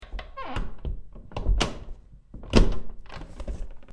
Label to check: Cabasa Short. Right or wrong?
Wrong